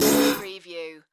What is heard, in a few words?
Distortion Fuzz Long Stab